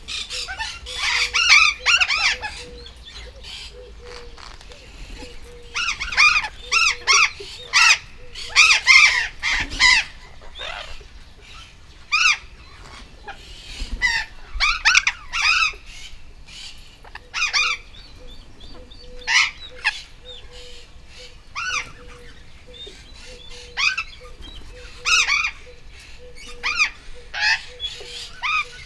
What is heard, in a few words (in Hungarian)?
nem rikácsolva, sziklapapagáj; debreceni zoo 2012; marantz puska